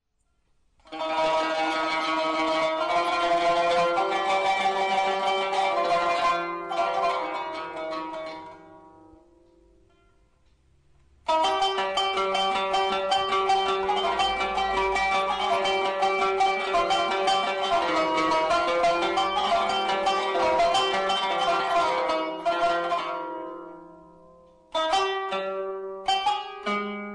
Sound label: Taqsim sikah